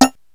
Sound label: SPLIT; Rubber Chicken Software Sample Bank